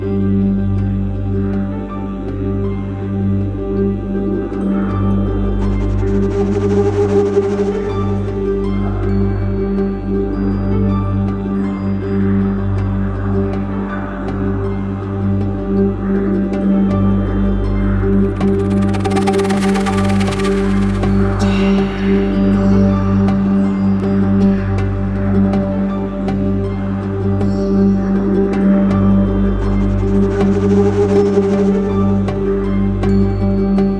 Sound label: Loop Stereo Mastered